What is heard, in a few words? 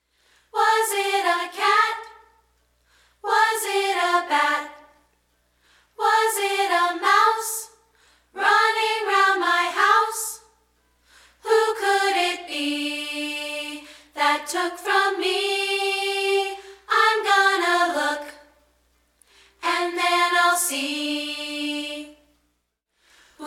ACAPPELLA